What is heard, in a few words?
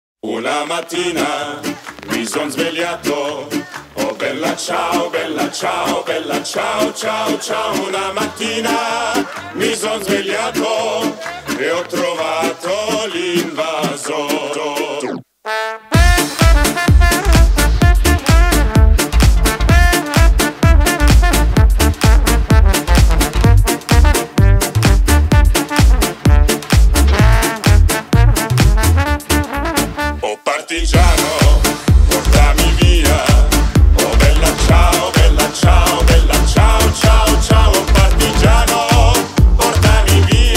electro swing